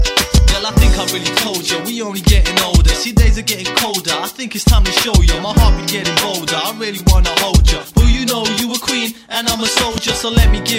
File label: Most Energetic Tone